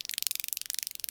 Prairie Day Close Cricket; Ambience

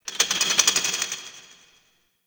Close Metal Hits, Asteroid; Ambience; Warframe; Grineer Asteroid

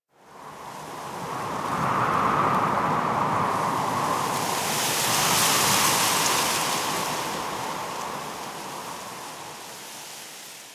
Night Wind Gust Medium; Warframe; Ambience; Eidolon Plains; One Offs

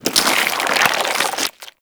Infested Devourer Retract; Warframe

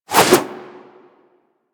Backflip Whoosh; characters; generic foley; Warframe

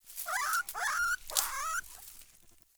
Beaver Idle Chatter; Encounters; Animal Rescue

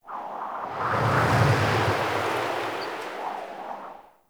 Medium Wind Gust; Wind Gusts

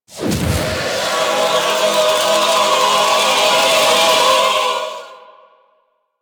Death Rise; Warframe; Kuva Larvling

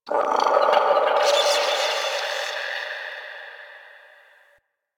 Landscape Cave Creature, Ambience; Warframe; Venus Open World; One Offs

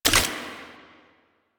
Shotgun Empty Reload; Weapons; Warframe; Pax Duviricus

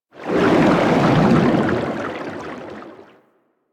water movement by; Warframe; DE; Vehicles; Sharkwing

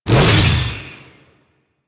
Saw Melee Swing; Weapons; Warframe; Grn Ghoul Saw Player

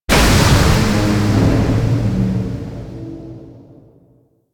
Corpus Gas City Reactor Open, Ambience; Warframe; Gameplay Remaster

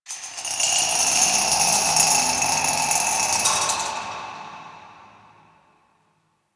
Misc Creepy; Ambience

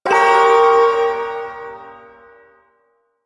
Shawzin Phrygian Chord; Warframe; Duviri Ersatz Shawzin

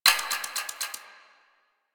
Hack Minigame UI Time Tick H

